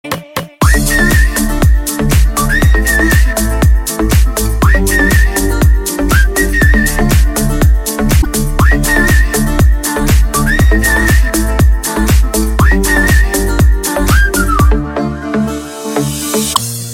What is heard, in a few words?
cool whistle